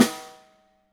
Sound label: snare, Real